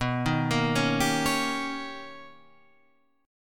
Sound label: B Augmented Major 9th B, chord; B+M9 Chord {7 6 8 6 8 9} arpeggiated